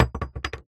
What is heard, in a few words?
Pot Hit